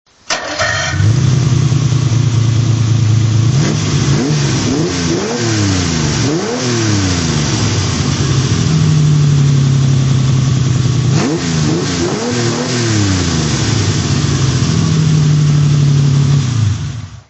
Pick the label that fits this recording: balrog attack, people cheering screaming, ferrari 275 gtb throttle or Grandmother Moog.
ferrari 275 gtb throttle